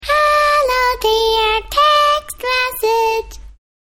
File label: Baby voice